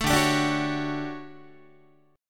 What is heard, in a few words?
D Major 11th D down Guitar 4A 4E 5A 5D 5G sharp 6C sharp; strummed; JGuitar